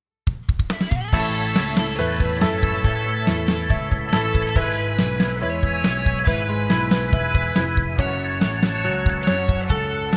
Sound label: Gospel Acclamation Alleluia